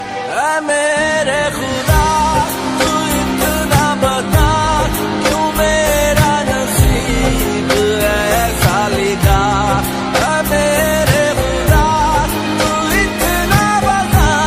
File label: Sad Song Ringtone